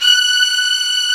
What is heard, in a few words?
STR STRING, Orchestral Strings